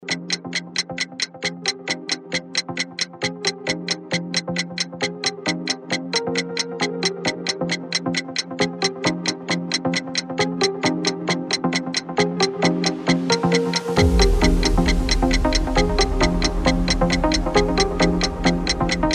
типа тиканье музыка минута для интеллектуальной игры; подложки

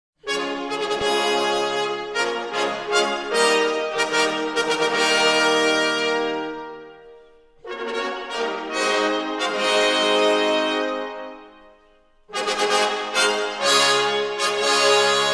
torzestvennye fanfary

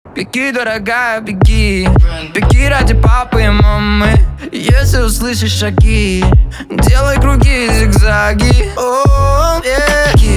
Отбивка

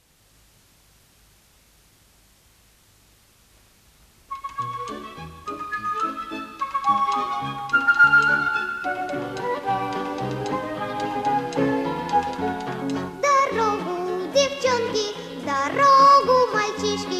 Школьные песни

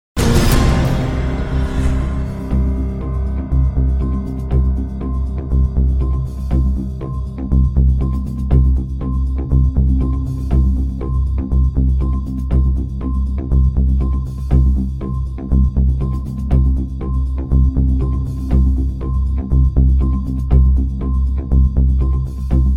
Музыкальная заставка

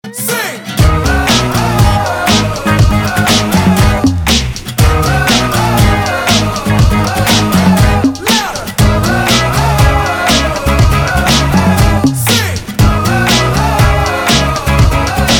Отбивка

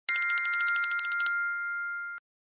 Звонок

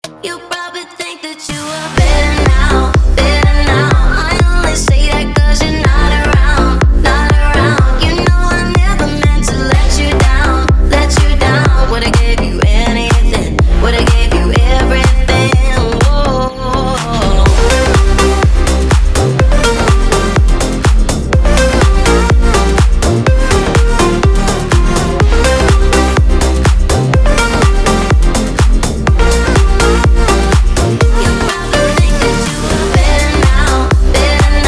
Отбивка

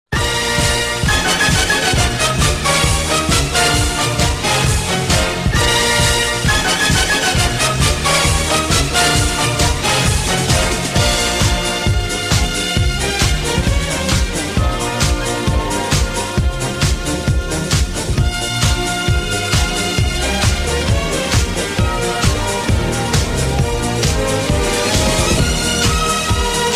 Музыкальная заставка

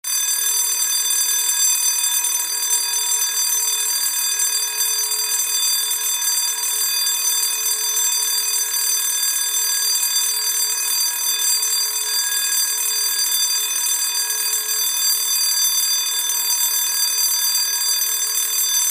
Школьный звонок

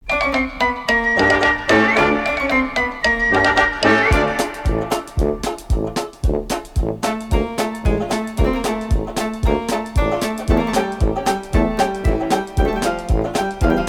Ехидная Музыка

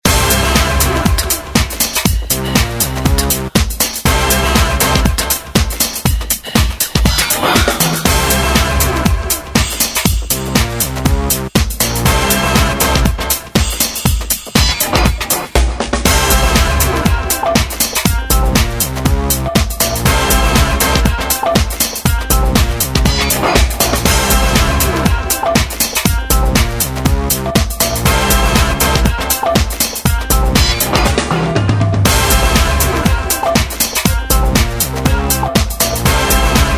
Музыкальная заставка; Модный приговор

